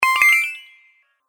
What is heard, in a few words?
Magic Interface Sound; Magic Sound Effects